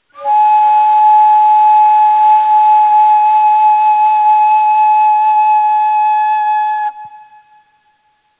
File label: Mahur, Neysample